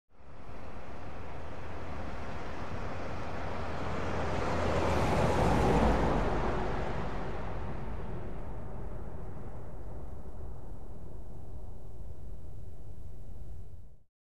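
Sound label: Car Exterior Medium By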